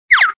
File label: trap